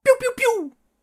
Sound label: laser guns